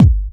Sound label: Soft Kick; Vengeance Samples